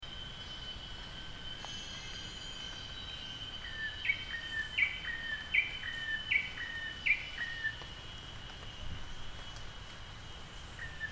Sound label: Rufous tailed Tailorbird Blue eared Barbet and Yellow rumped Flowerpecker at Kubah NP Sarawak; Borneo